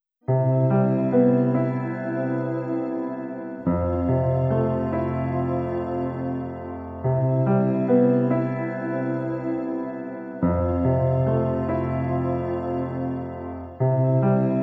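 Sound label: background music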